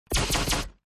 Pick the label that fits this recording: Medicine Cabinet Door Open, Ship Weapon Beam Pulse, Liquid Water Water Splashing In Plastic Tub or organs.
Ship Weapon Beam Pulse